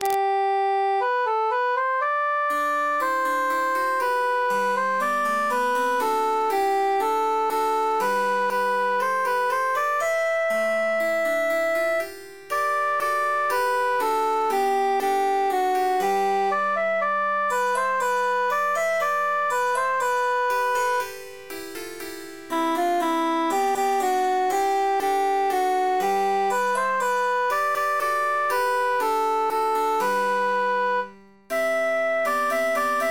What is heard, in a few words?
how merrily cantus emphasized